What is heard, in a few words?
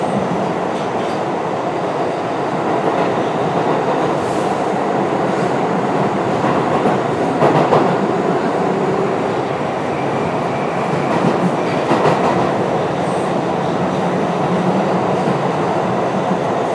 Train Loop; TrainChase